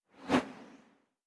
Boulet Engine Whoosh

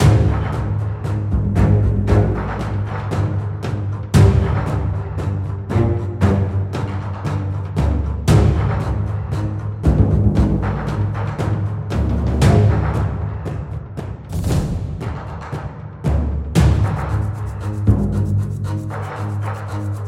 Fight; Father Wolf Fight level 1

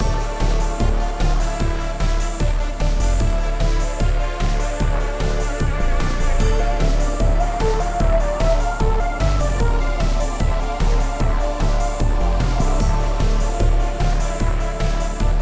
Dialogue Music; Aeon Must Die; Stage2

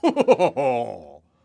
clown throw; Ringling Bros. and Barnum & Bailey; Wii

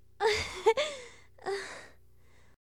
giggle; hilzartov fairy size doesn't matter; SFX Sex Lines Foregiggle

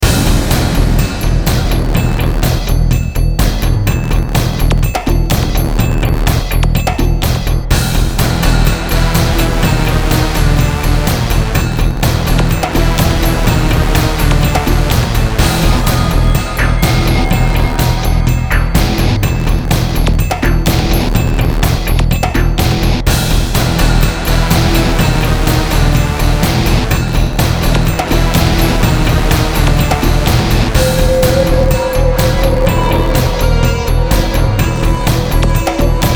Pipes Fight; Infernal; xbox 360

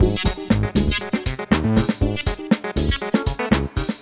Background Music; daves castle hunt online gamerip 2009

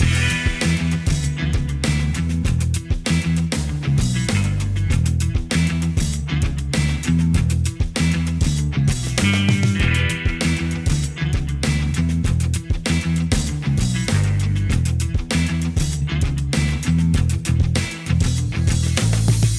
Casino Theme

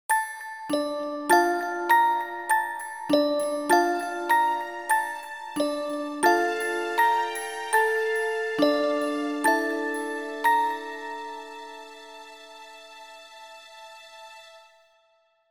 Game over; undefeated windows gamerip 2015; Gyro

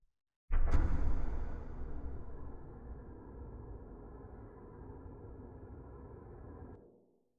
City SFX, CITY Mech Window Lights

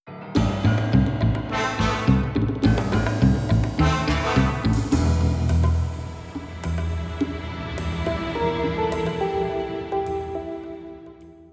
Sting

